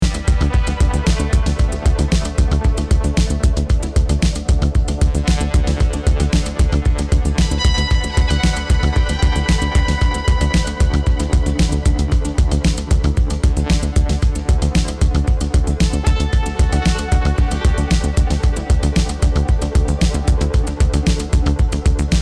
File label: 100Hz BOOST 3.5 100Hz Atten 6 16kHz BOOST 9 20kHz ATTEN 5